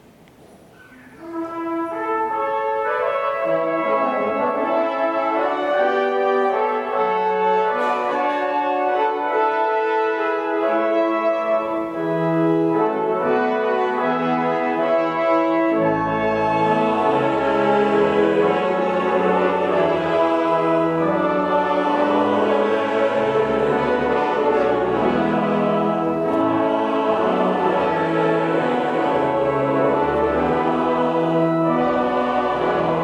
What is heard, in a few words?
choir; triduum 2018